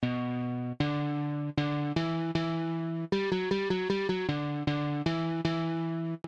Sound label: riff